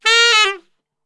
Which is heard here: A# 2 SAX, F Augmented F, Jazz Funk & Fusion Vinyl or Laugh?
A# 2 SAX